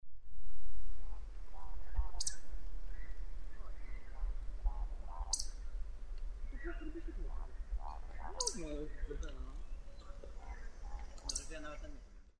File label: woodcock, bialowieza